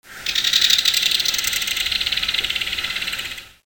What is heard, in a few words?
Belted Kingfisher